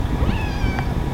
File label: meow